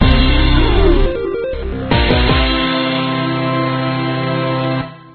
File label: Level Complete; The Adventure Pals